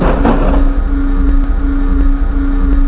door move

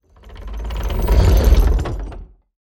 seesaw stop; fallguys

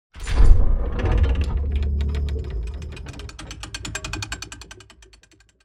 seesaw start; fallguys

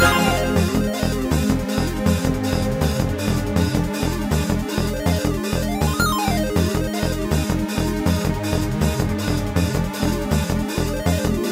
space music; ragemap2023

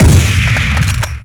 sniper; ragemap2023

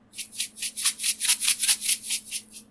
salt shaking gentle; ragemap2023

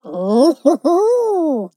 player victory; fallguys

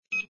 beep

